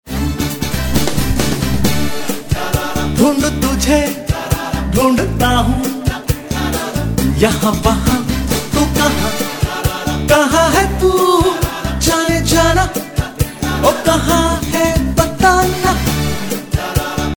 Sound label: ringtone